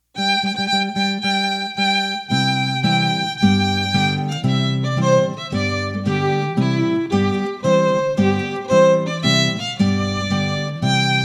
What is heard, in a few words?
Trumpet Tune